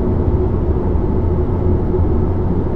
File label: wind; stunt rally